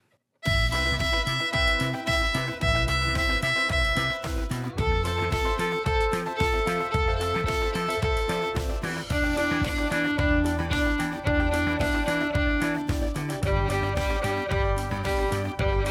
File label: Violin